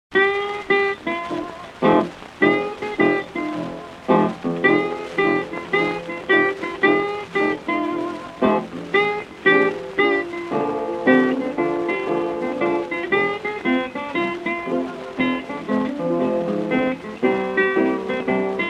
Slide Guitar

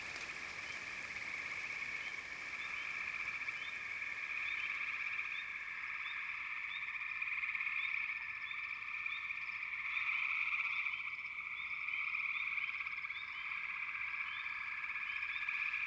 Frog Chourus; American Wilds; The Natural Sounds of the Wilderness 10 CDs collection

